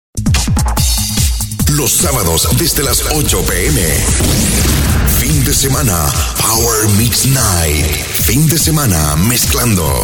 power mix night

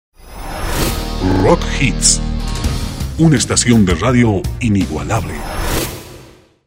rock hits

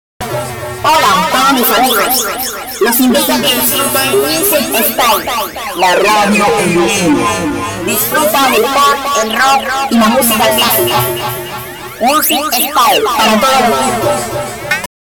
jingle music style

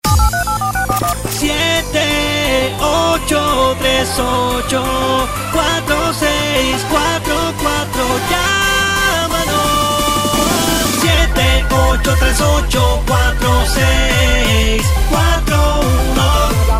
jingle; teléfonos

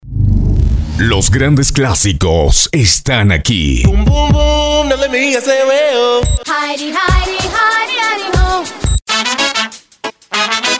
reggae; todos los tiempos

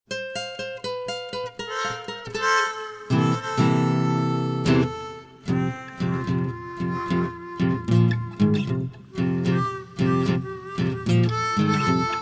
blues

